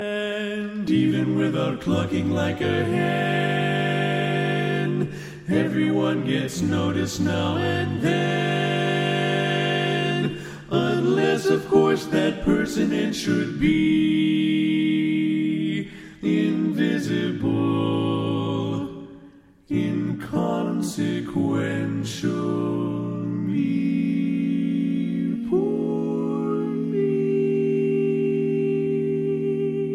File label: Tenor Left